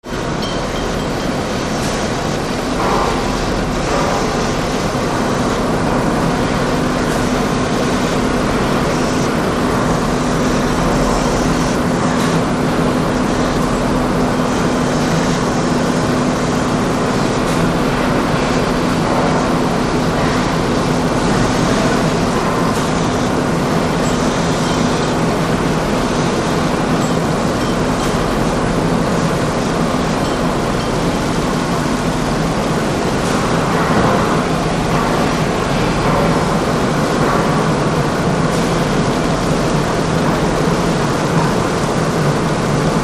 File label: Factory Background, factory